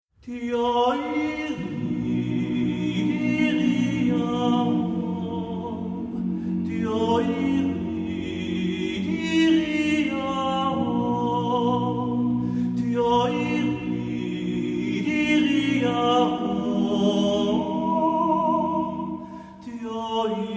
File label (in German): Weihnachtsjodler